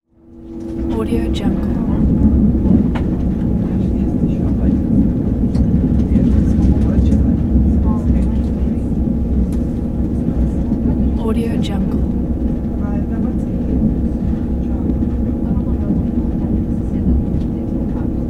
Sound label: train inside